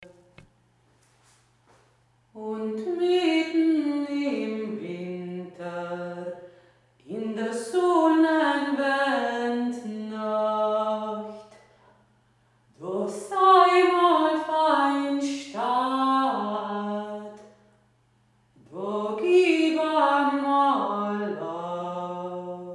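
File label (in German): 2 stimme, jodler; mittn im winter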